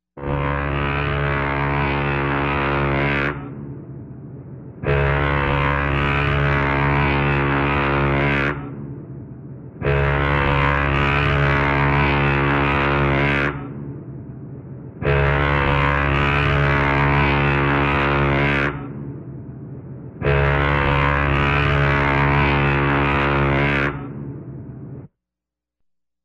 gudok laynera

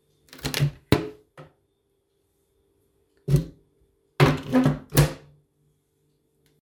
malenkaja dverca derevjannogo shkafa s tugim zakryvaniem

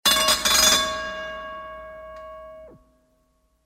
zvon kolokola; Звуки подводной лодки

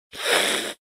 korotkoe shipenie

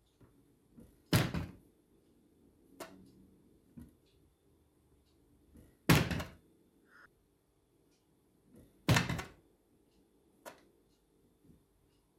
dvercy na kuhne so stekljannymi vstavkami

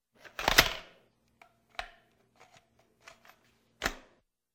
Protivotankovoe reaktivnoe ruzhe perezaryadka

